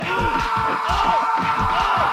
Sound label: scream sfx